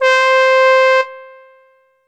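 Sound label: E-Mu Proteus FX Echo Trumpet C5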